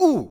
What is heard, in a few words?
"ooh"; HeavenStudioPlus; djSchool